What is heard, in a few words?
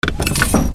flight